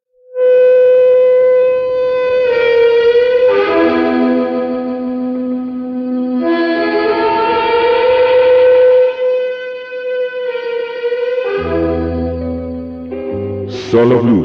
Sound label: solo blues intro